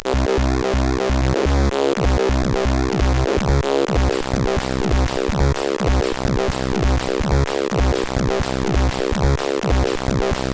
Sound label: Techno, CSG8580R5; Techno 1